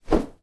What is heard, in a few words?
Whoosh Rope